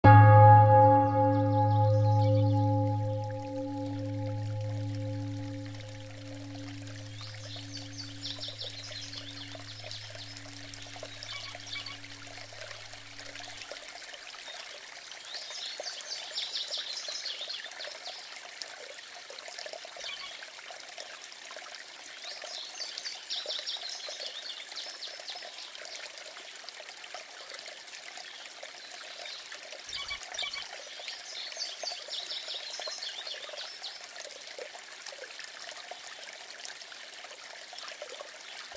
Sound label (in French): Sons ruisseau + Cloche